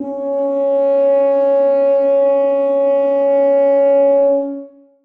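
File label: tuba sustain c#4; Sonatina Symphonic Orchestra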